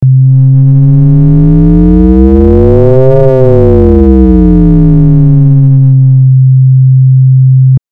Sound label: Mod Int logFM